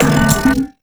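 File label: Bello Oneshot, beats; Sonic Foundry (Sony Creative Software); Esoterik Beatz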